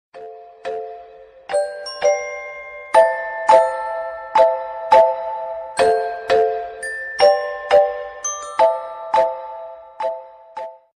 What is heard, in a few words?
soft alarm bell ringtone